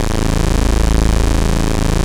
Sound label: OSCAR A1, Synthesizer; Hollywood Edge - Giorgio Moroder Rare Synthesizer Collection